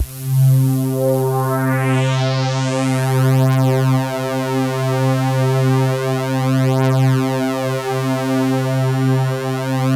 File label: XXL SWEEP, BIG SWEEP; Masterbits; Vintage Voltage